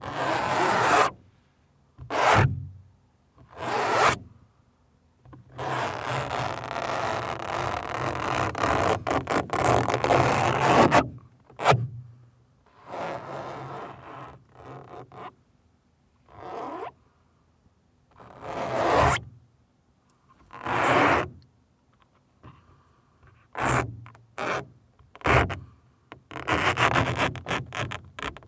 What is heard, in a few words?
Scratching The Car Ceiling Using Fingernails